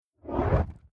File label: Whoosh Fireball Incoming Flame Heavy Flanging; Battle